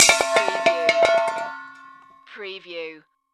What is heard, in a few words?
Metal Dropped - propane tank